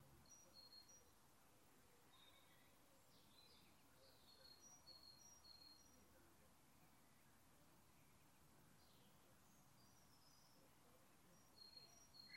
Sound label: Garden Evening